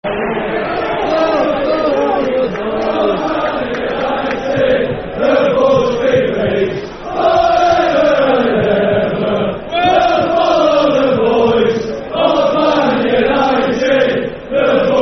Football Chant (Soccer Song), Man United; Forever And Ever

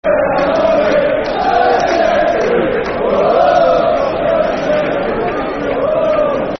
Football Chants (Soccer Song); Mcsheffrey; Birmingham City Bcfc

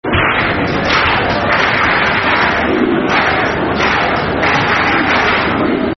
Blues (Clap), Football Chants; Reading; Reading Rfc

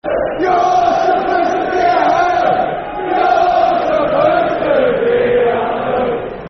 Football Chant (Soccer Songs); Bristol Rovers; fanchants-com

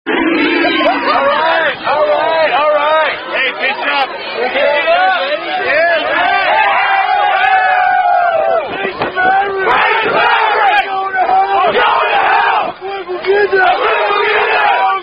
Rugby Chant

